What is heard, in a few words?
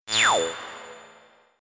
codec unlock; ringtones